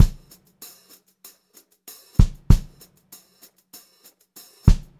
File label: Brushed Drum Loops